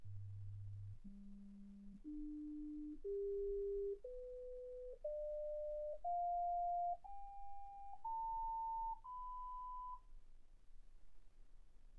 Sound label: sinus tones 48kHz